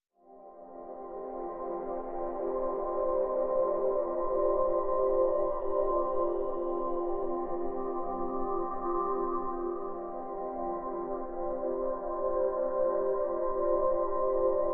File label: Pads Bliss (F)